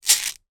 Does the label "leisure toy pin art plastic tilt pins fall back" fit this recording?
Yes